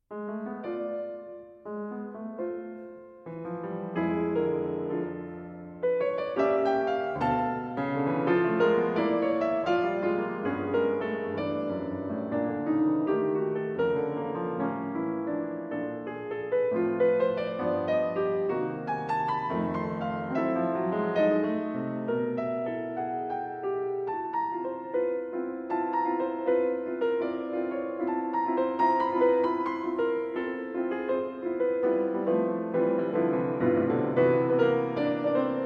Piano Preludes No. 3 in D Major, Allegretto

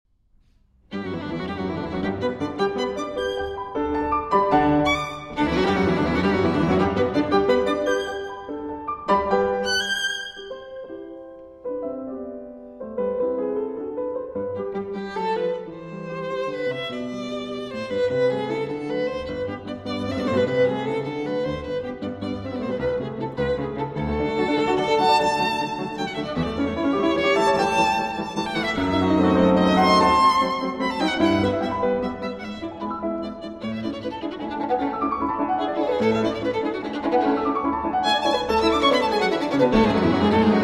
Violin Sonata in G Major, Allegro Assai

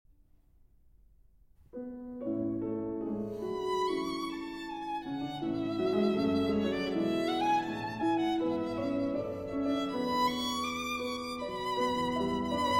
Violin Sonata in A Major, Allegretto Poco Mosso

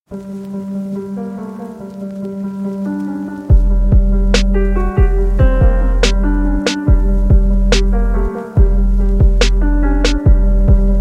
Pianos + 808 + Beat

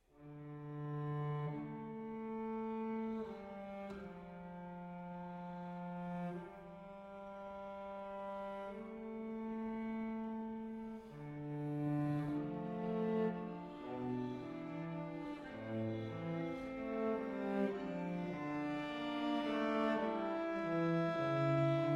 Fuga à 3 soggetti (Arr. for Oboe Quartet; Die Kunst der Fuge; Contrapunctus XIV

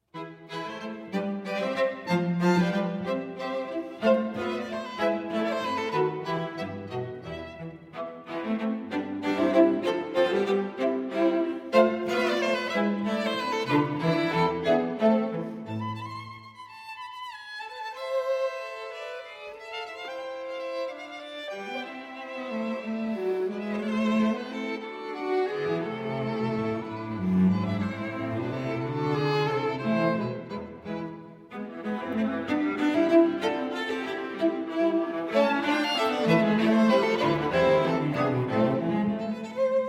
String Quartet in A Minor, Menuet

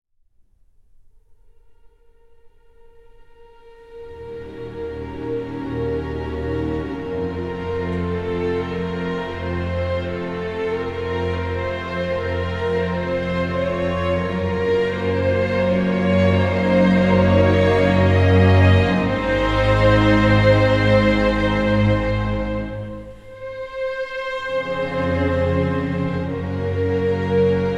Adagio For Strings; Barber; Op. 11

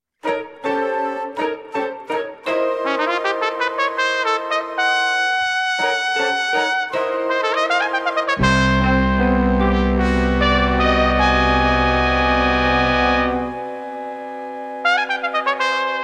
Jazz Ensemble, Concertino For Trumpet; Concertino For Trumpet and Jazz Ensemble

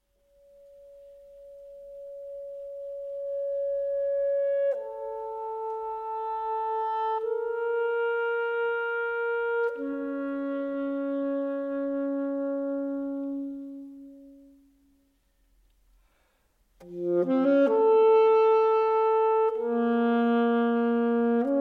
For Alto Saxophone & Orchestra; From Catch Me If You Can; II. Reflections

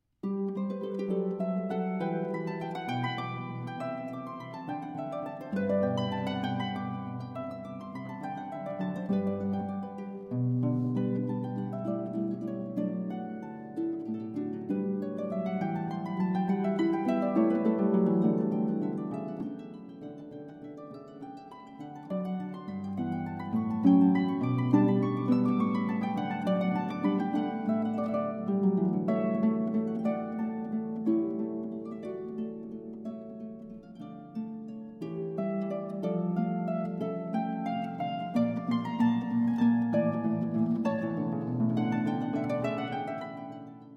Harp Sonata in G Major III. Allegro

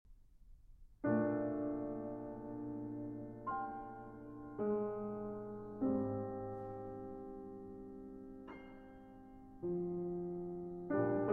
Piano Sonata in E Major III. Largo

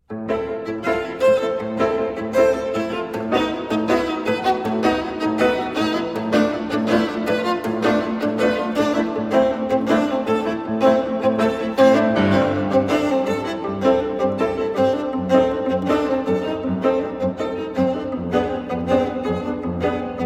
2 Violons and Piano

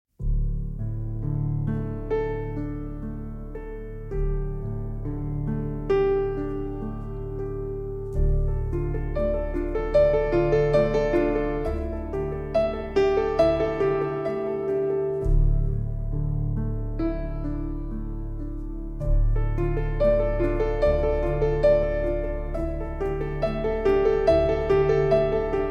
Piano Version

